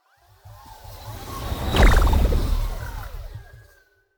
magic warp personal jump wobble; swooshes-swishes

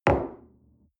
coffee place table soft short, restaurant cafe

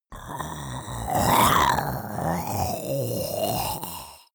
monster choking zombie idle

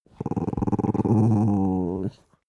dog small growl soft; natur-tiere; hunde

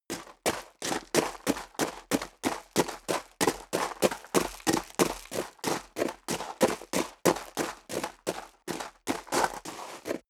step high heels gravel medium run sequence; schritte; Footsteps; menschen

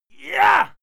male fight scream yah short; menschen; schreie

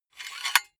silicone gun empty pusher pull out fast; berufe; handwerker; soundpool

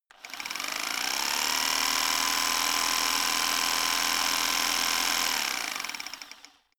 rock drill chisel fast; maschinen; schrauben drehen hydraulik

